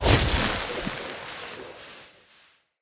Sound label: Fey Ward Stone Projectile